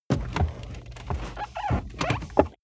car bmw e46 interior seat adjustment up

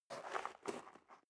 step dress boots gravel light scuff; Footsteps; schritte

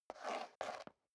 step leather shoes concrete scuff

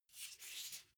car flip key handling

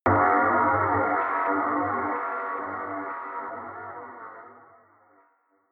synth hit phase wobble, hits impacts; whooshes rises

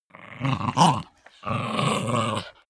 chihuahua snarl fight; natur-tiere; hunde

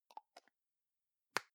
plastic can lid screw close soft long; objekte; dose

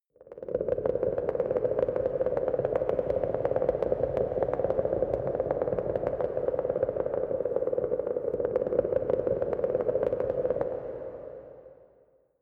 promo pad

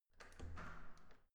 entrance door open soft quick

